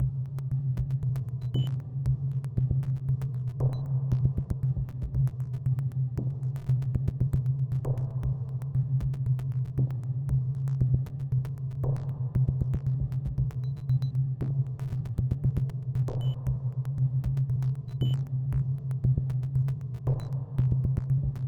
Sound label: Ambience; cupe folkfest 2015